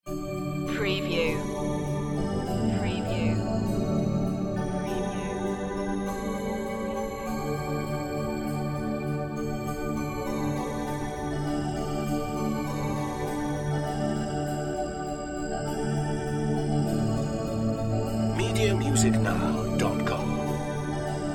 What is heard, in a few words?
joy to the world bells keys only